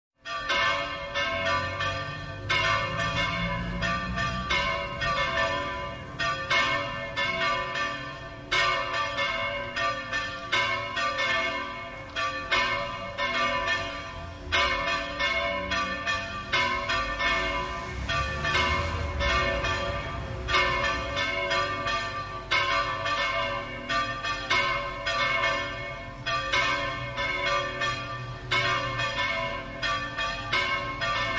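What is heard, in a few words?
City Church Bells Medium; bell towers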